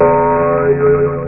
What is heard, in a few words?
blink